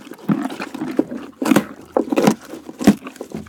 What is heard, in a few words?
Horse eat; anemoi